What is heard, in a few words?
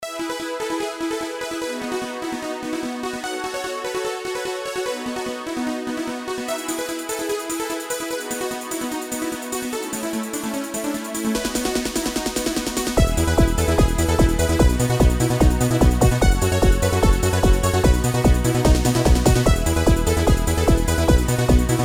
Yamaha QS300; Fred2Bett